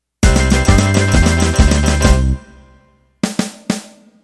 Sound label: Country Shuffle Intro